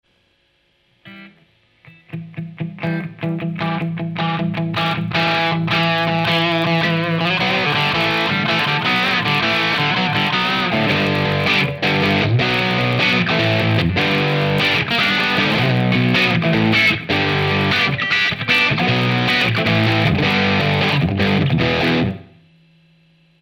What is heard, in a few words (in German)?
Knistern bei Audioaufnahmen